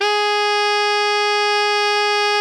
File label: ALTO FF G#3, saxophones; Greytsounds - Philip Wolfe David Crozier's Saxophones; ALTO SWITCH